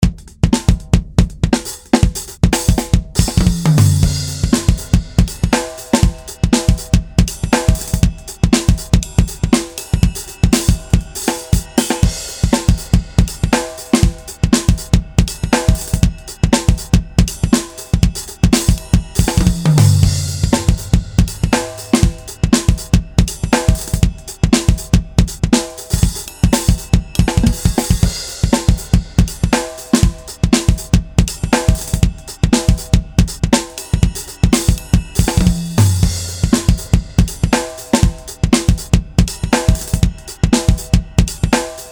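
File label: drums only; drumod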